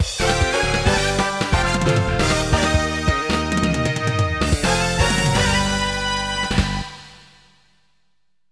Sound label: Game Start; neo turf masters; neo geo cd